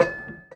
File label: Prepared Piano; Battery 3 Sample Kits